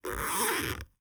zipper medium

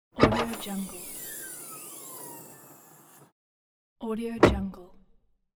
car door open and close

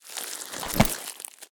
inventory alien item pickup; war ui; esmteam

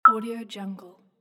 button

